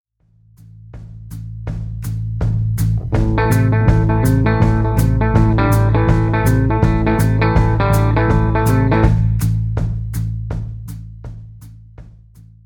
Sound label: The Turnaround, blues, guitar; guitarist presents blues